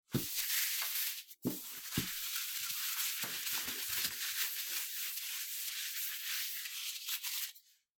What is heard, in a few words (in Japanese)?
テッシュでテーブルを拭く音